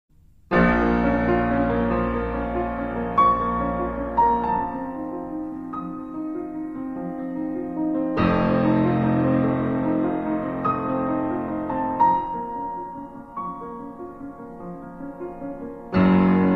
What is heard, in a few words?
Piano Sonata; Horror; Interview with the Vampire; Lestat; Joseph Haydn